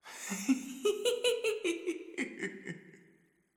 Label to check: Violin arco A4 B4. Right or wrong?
Wrong